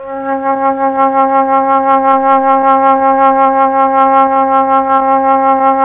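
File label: Mellotron Flute C4; Tron Flutes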